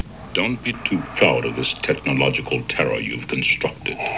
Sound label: terror; Tech. terror